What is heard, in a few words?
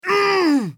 scav wound; eft